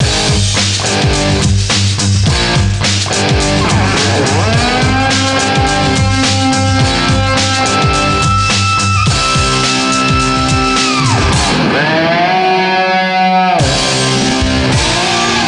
grunge

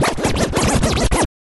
DJ Scratching

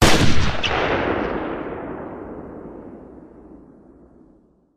gun shot

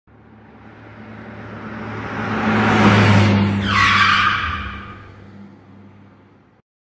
Car Skid

